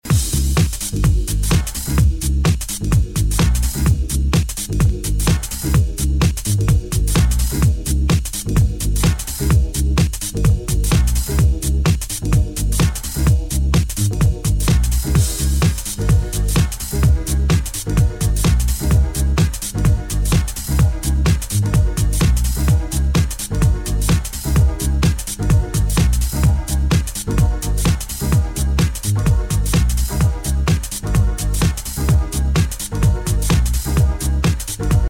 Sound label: Melody, RingTune